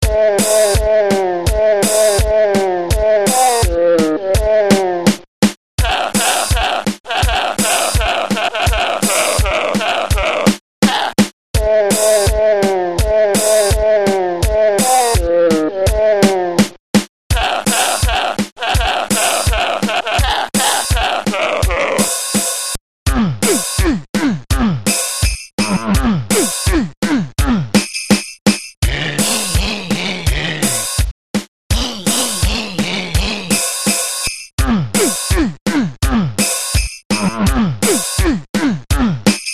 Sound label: jingle; Scragadelic; DooM